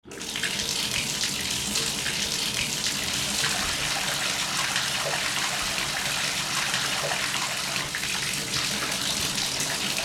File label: Bathtub filling up with water